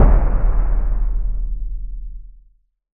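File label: deep house FX, 125 bpm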